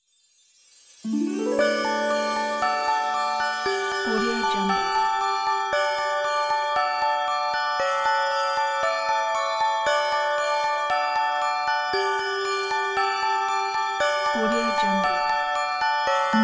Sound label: christmas story